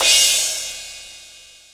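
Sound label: R8 crash; X-Static Goldmine 1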